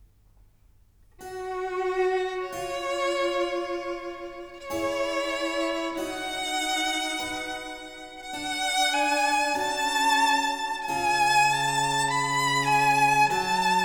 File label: Violin Sonata in A major, op. 5 no. 9- 3. Adagio; Corelli